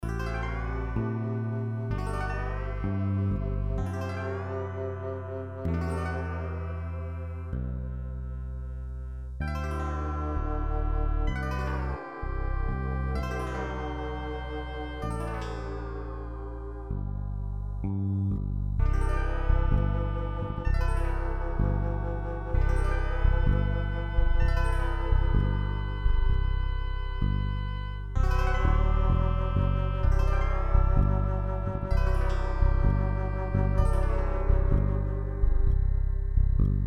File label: drum